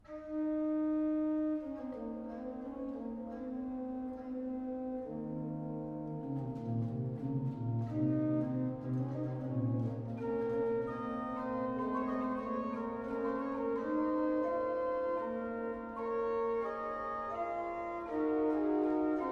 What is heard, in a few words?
Ricercar del primo tono; Giovanni Gabrieli; Sonate Canzoni